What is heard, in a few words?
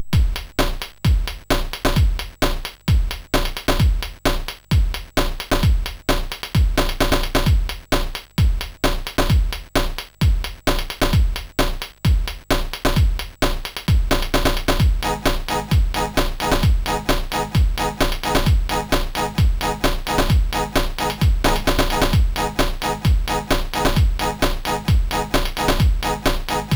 Rag Beat 64, MOS6581R4